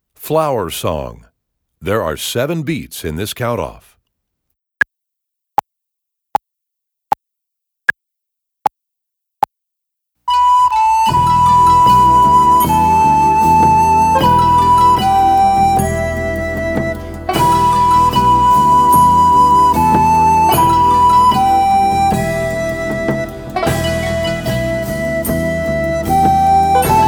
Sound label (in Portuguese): Flower Song com flauta guia; Obra Obrigatoria Nivel 2; Concurso de Flauta de Bisel